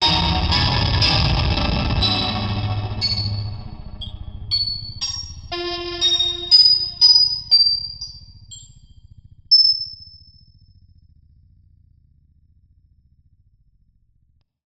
the bad piano; sadie's bad piano

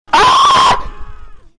the sound of pain

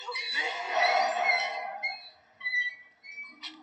swinging lantern

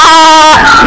bahaha

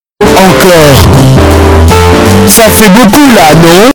bass boosted

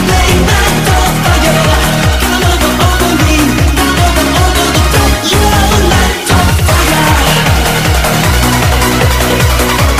eurobeat

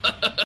ninja laugh

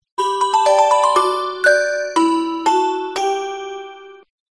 Cute Melody ringtone

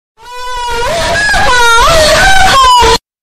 loud moan bass boosted

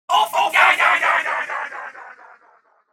delay

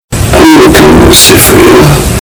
earrape

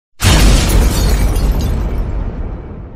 Explosion and Glass Breaking sound effect; redbar explosion

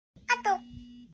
kakaotalk

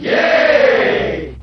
yaaaaaay

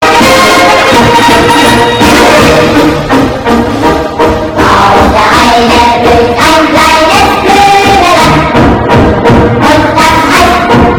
Erika German Military March; Erika Nazi

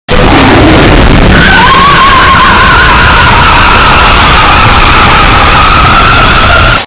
guy screaming his heart out; ichigo scream

